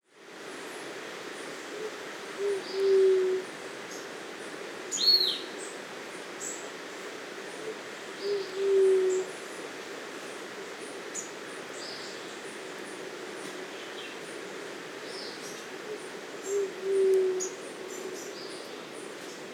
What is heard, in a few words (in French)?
Geotrygon mustacea, chants des oiseaux; Chants des oiseaux de la Guadeloupe